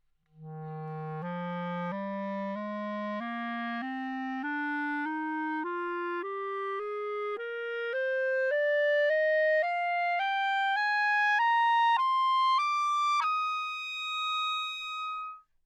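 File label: legato ascending, anechoic modern clarinet bb; OPENAIR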